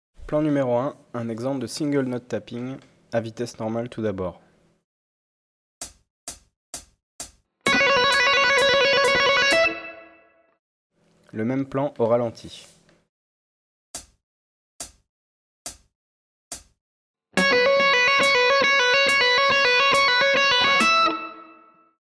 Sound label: one note tapping